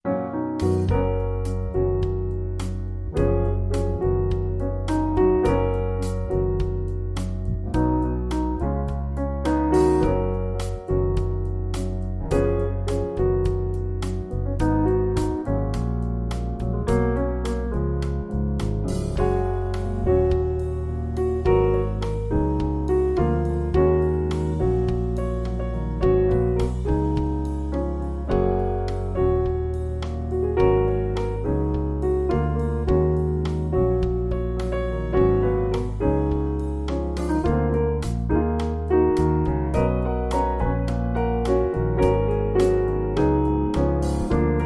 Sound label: With Trio; 60sec